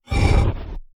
nosach Hit; metrocraft; customnpcs

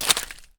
ice footstep; metrocraft

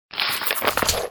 quest start; metrocraft; customnpcs

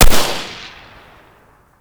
sv98 silencer; metrocraft; weapon

